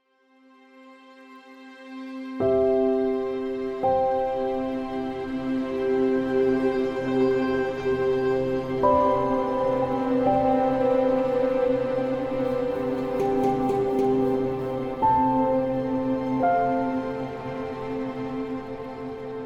Cinematic Score Orchestral; Liliane Susewind

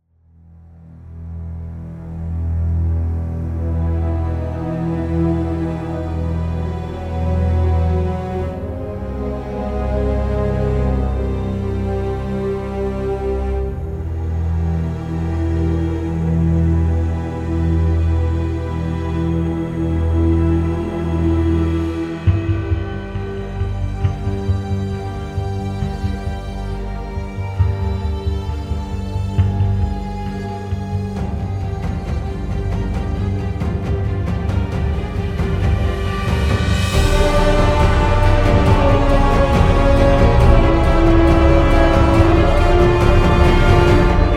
Cinematic Orchestral Hybrid; MKX

